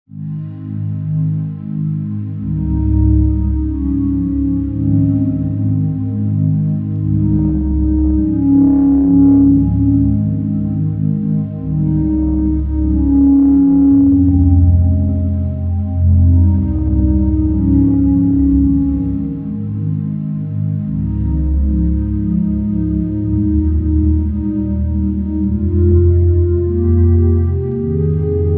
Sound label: Underscore PAD; Worship Flow